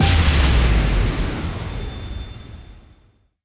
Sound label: big Win Text; netent; sweetyhoneyfruity